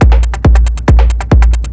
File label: drm 138, Techno, drum-loops; Techno ID; rhythm; Best Service ProSamples vol.45